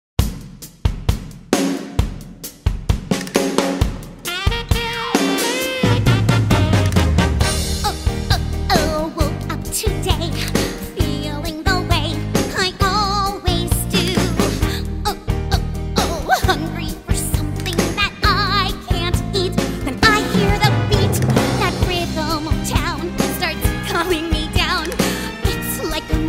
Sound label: vocals; amp regional arts and media program